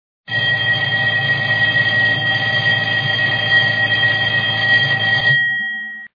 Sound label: win, slots